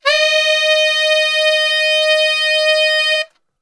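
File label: D# 3X SAX STR, saxophone; Best Service; Brass Super Section; SAX STRGHT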